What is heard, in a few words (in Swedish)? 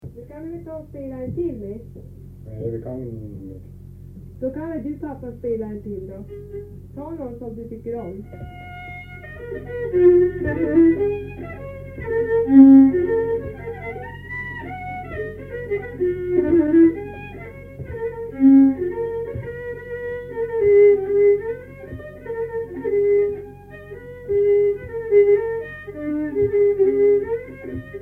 Polska